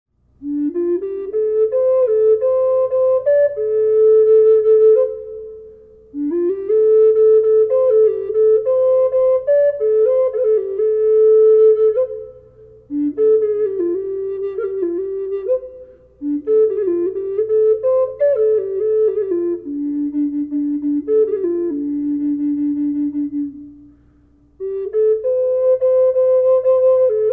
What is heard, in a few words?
gray wolf D Reverb flute